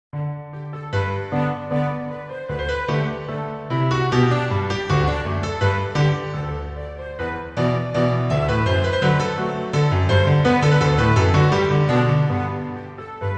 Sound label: Orchestral Arrangement